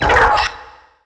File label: facehugger spot; svencoop; aliens